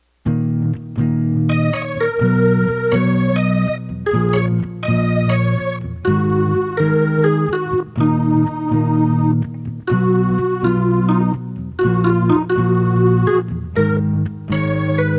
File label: Keyboard Solo